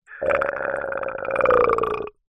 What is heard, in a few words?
human burp forced wet; zapsplat